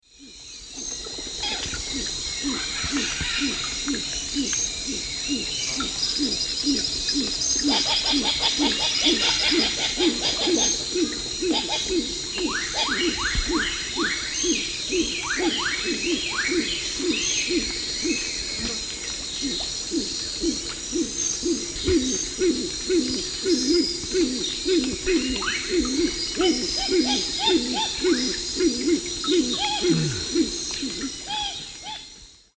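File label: Anhima cornuta, calls playback; S. America; Eastern Lowlands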